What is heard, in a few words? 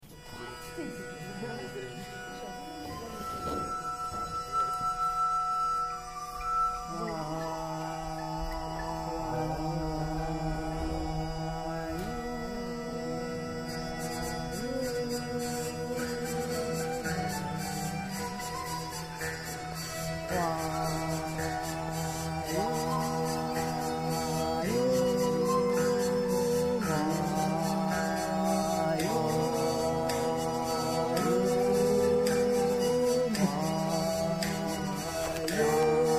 Royaumont Medieval